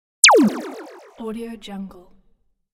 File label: laser